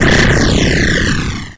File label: Storm Magic